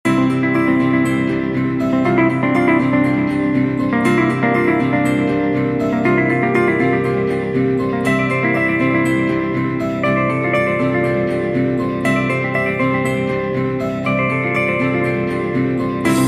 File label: iphone ringtone